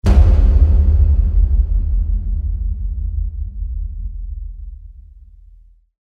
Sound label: short drums; Crysis Warhead